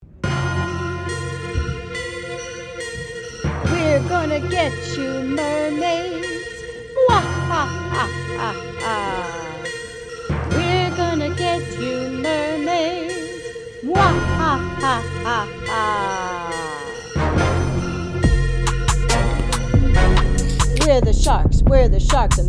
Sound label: vocals